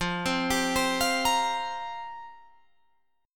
F Suspended 2nd Suspended 4th F down Guitar Drop D